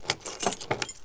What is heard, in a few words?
Auto Door Unlock; Automobiles